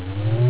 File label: block